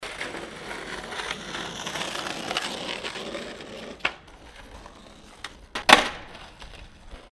skate board passing

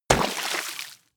horse step water

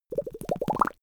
bubbling lips

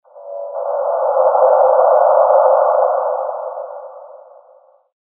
midband noise ambient

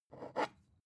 glass bottle pick up fast

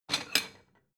plate small take slide hard

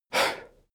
male breath fast out

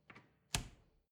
refrigerator door close

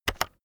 keyboard external enter press fast heavy

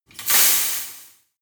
metal impact fence short

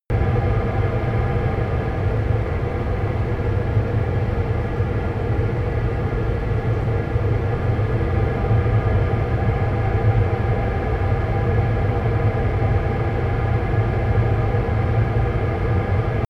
train wagon ventilation exterior

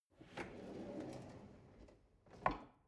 drawer narrow open

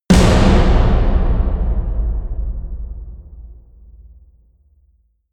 huge snares

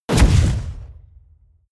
incoming explosion

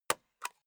stapler staple

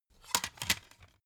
cd in cover hard

